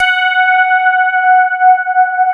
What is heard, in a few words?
SPARKLES; Wizoo - Powered DX